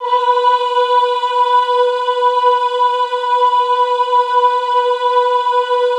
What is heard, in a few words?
AHH B3, Classical Choir; Best Service; Extended Classical Choir; AHH MIX